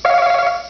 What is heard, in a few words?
locked in; usa; trivtrap